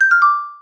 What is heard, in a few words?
talk end